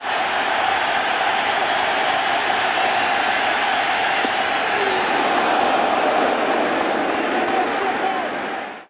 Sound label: Baseball Crowd Cheer "Oh"; Crowds